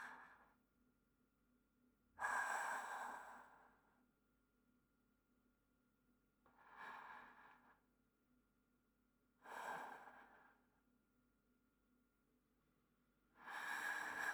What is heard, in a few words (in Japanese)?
寝息トラック; vol.3 ハリネズミ; 一緒におやすみ